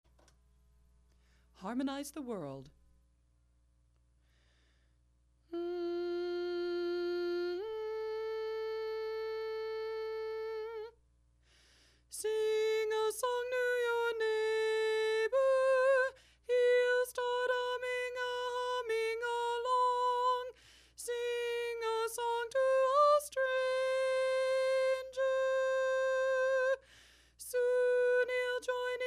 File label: Harmonize tenor alone